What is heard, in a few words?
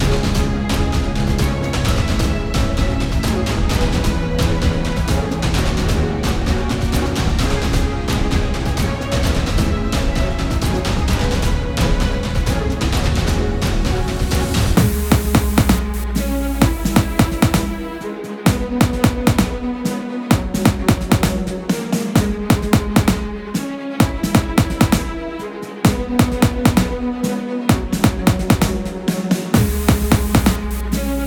rock